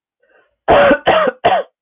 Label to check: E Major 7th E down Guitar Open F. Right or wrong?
Wrong